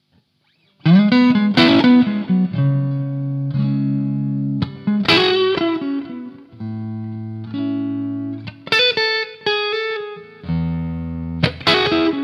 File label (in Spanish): solo, guitarras